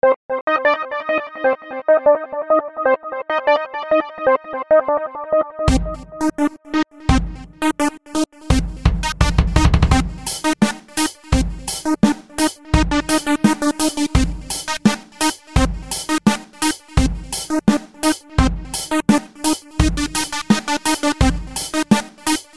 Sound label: Club